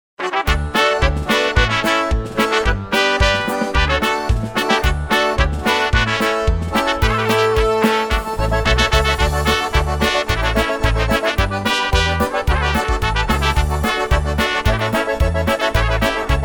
Polka

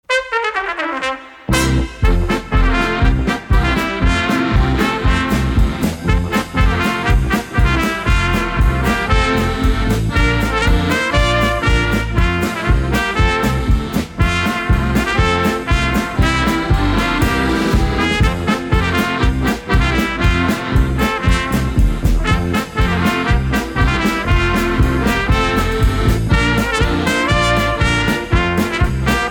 Polka

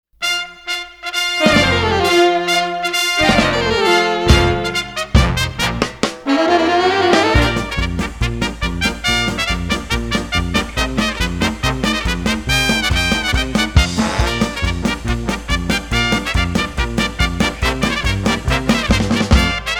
In A Trumpet Mood

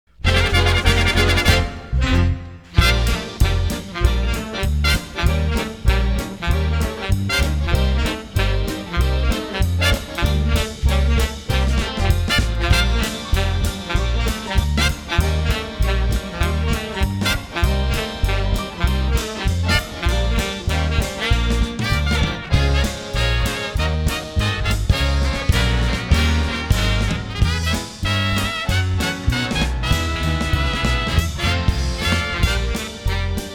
Swing

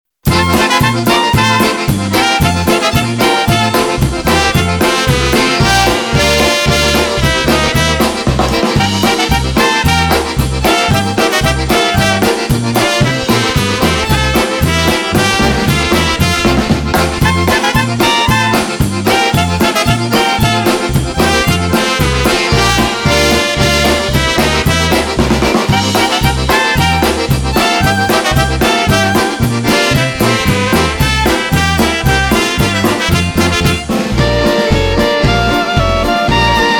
Polka For Quintet Polka

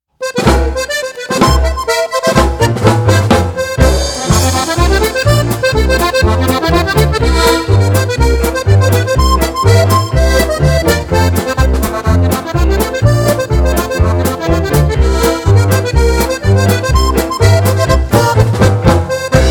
Polka

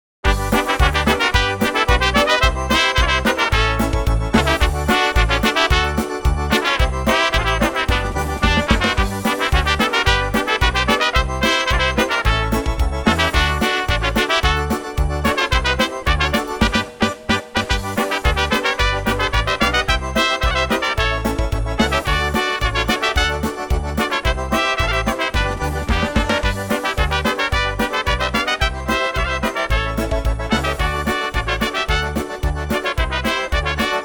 Polka

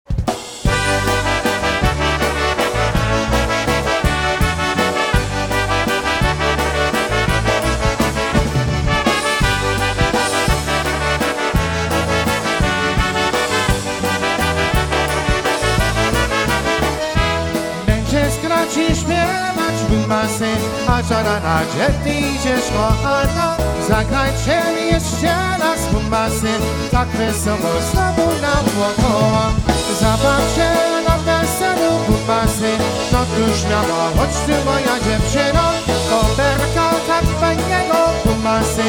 Oberek, Live Jam

